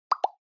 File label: Smartphone UI Sound Speaker